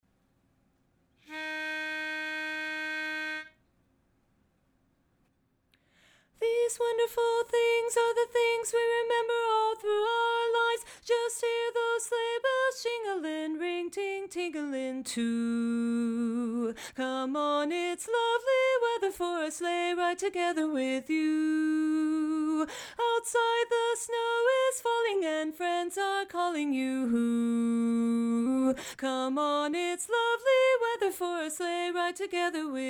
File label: Lead Alone; holiday music